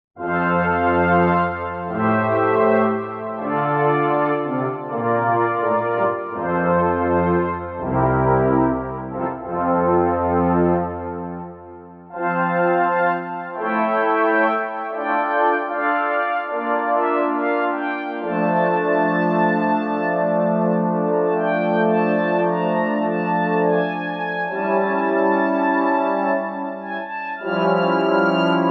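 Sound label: Brass FANFARE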